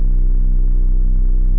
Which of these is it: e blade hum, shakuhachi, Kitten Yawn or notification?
e blade hum